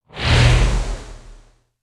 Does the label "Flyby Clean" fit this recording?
Yes